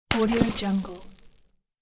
game quick burn

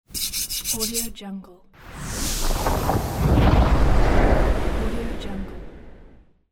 alladin lamp rubbing and summon genie; game

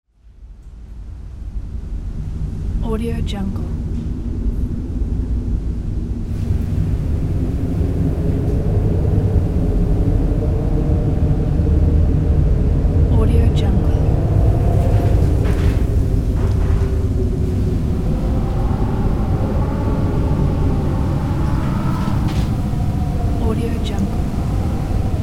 wind; nature sounds

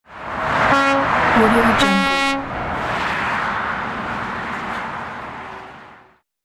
truck horn honk blast

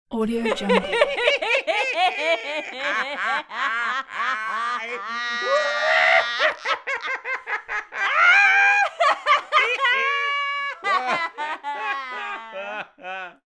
male and female psycho loud laughter; human sounds

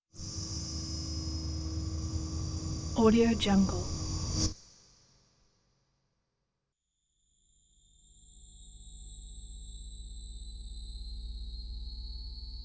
accelerating tone piercing high pitch ring; futuristic sounds

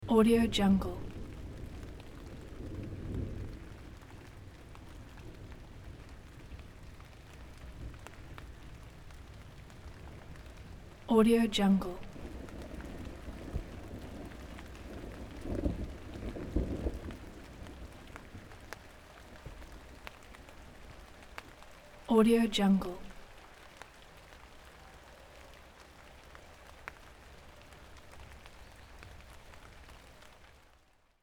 rain strong drops thunder subtle distant; باران شدید با رعد و برق; nature sounds

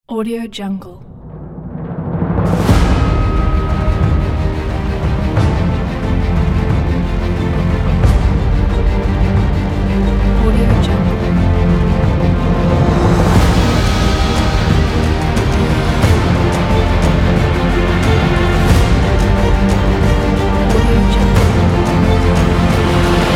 epic war; موسیقی بی کلام; حماسی جنگ

